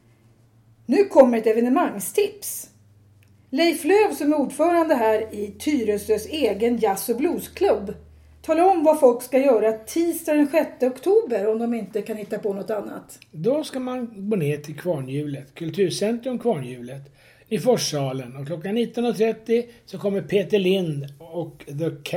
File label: jazz